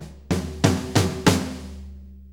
6-8 Fill, Drum Tools

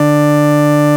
FARFISA D1; Sonic Reality; Vintage Timetraveler

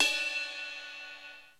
RX15 RIDE; Crypton - DrumBase5000; RX15+17+21L

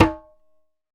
Dholak Ta; Roland BK-5; Percussion

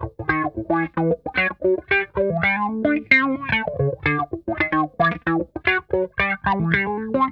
RISE; Zero G - Funk Guitar

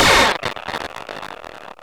FM FX, synth

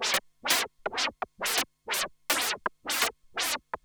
Juno-60 Arp 125BPM

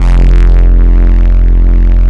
BASS F1

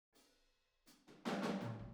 Boogaloo Intro Room ST, Drums, 82 BPM

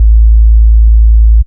DR. LOOP, BASS; X-Static Goldmine 3

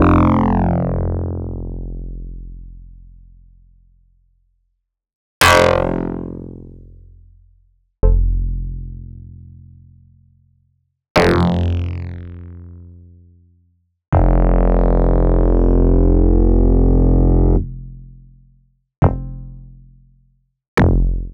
Casio VZ10M Bass

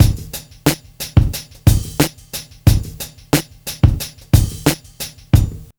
90 BEAT, Hip Hop R n B; USB Soundscan vol.19; AKAI

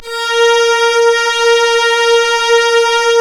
Synclav FM STRINGS A#; Big Fish Audio

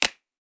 clap, hip-hop drum kit

